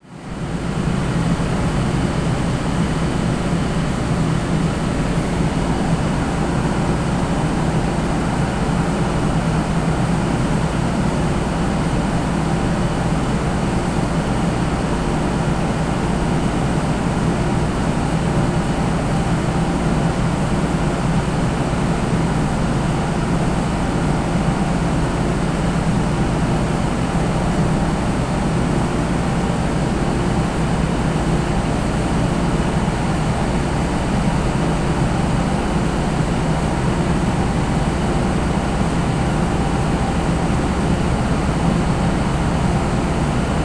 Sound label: ferry engine